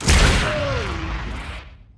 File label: jetski; giants